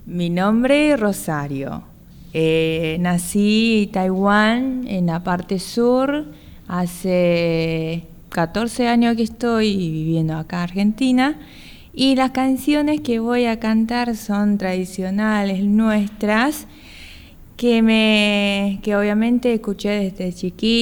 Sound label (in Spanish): canciones de cuna